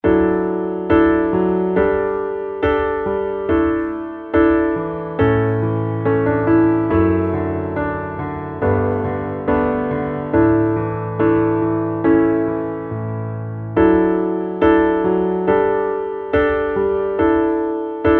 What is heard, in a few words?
Underscore PIANO; Worship Flow